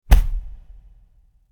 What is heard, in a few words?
Impact Bump